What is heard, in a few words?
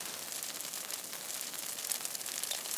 Sizzling Flame; fruitblaze; netent